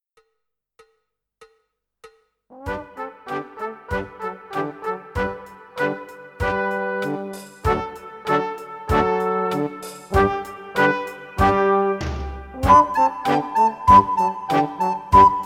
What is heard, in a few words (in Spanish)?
corcheas rapidas, flauta